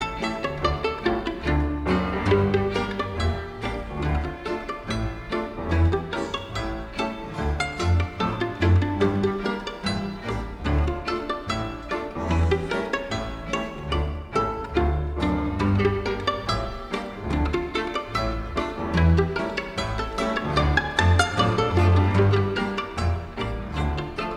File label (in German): Zwei gitaren